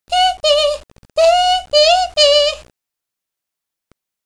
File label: hehe